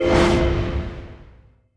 skill up; effect

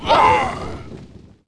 fall; monster; milgyo founder

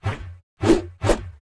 swing; monster; chuhen

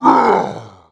fall; mutant

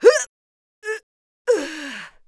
dead, assassin voice

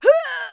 fall; assassin

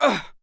damage, sura, voice

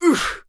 damage, sura, voice

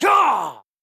combo, shaman, bell

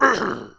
fall; monster; maenghwan

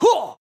attack; shaman; general

